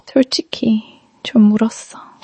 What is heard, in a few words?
crying